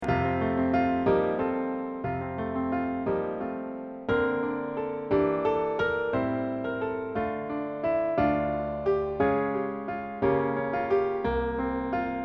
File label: break o sea accomp